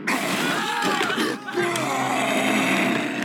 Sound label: Roar, Adult; Kong; KKotA